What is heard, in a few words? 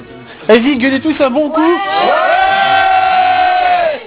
cri; anniversaire; écoutez nous crier !